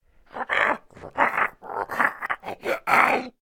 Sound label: idle, zombie; DayZ-Epoch; dayz sfx